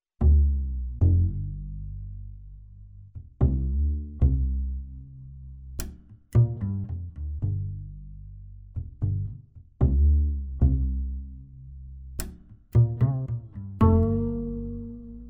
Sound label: corebass